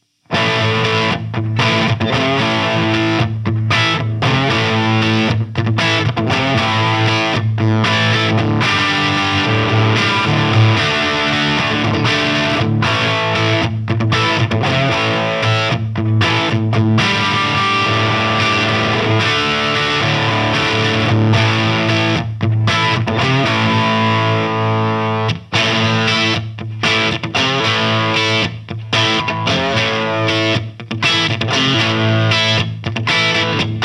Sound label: Chapman Guitars ML2 Pro Crunch; Azure Blue